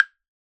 Chinese Temple Block; Percussion Samples

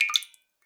Drips

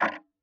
Tick, Clocks Kitchen Clock

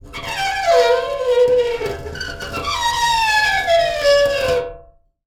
Pane Of Glass Rub

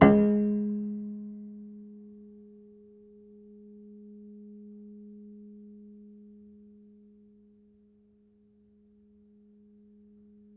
Harm Mid, Piano Harmonics

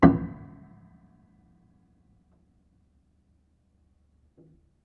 Hamm Perc, Piano Hammer Perc; Piano Miscellaneous

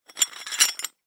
Hole Saw Rattle, Hole Saw Bits

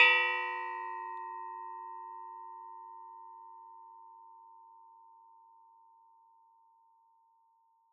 Metal Teapot Stand Strike

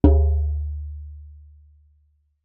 Jembe; Percussion Samples

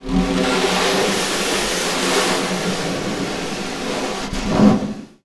Snare Drum; Percussion Samples

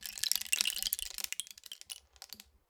Rain Stick, Percussion Samples

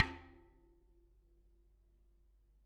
Bicycle Spoke

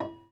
Prepared Piano

